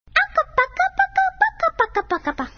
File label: babbling; Dora